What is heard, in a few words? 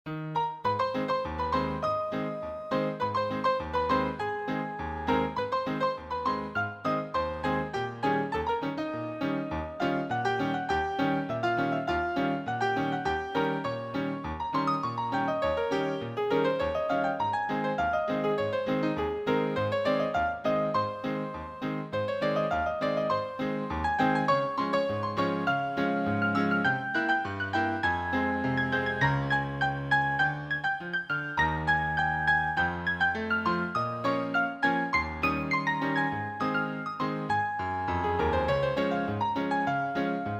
flying circus rag